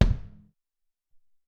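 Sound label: Raw Kick, Alesis SR-18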